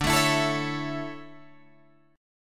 D Major 7th Suspended 2nd, Guitar, Open G

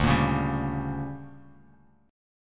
D Major 7th Suspended 4th Sharp 5th; DM7sus4#5; strummed; JGuitar

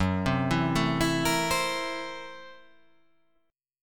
F sharp 7th Sharp 9th Flat 5th; arpeggiated; JGuitar

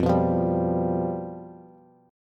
F Suspended 2nd Flat 5th, Bass; strummed; 13,14,15,12; JGuitar

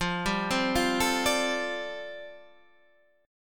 G 9th Suspended 4th

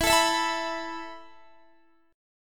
E Suspended 4th E down Guitar; strummed; Esus4; 4F,4A,5C,5G,5C,6E

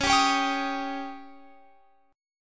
Db Suspended 2nd Flat 5th, Guitar Open F; strummed

